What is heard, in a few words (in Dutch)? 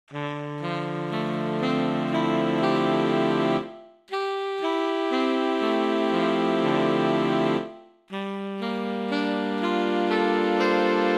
Arpeggio in mineur